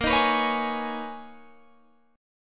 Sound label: B Major 13th A sharp, guitar